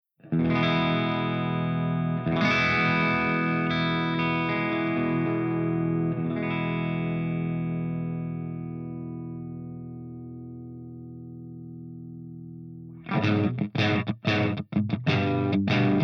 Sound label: PEAVEY STANDARD GB SC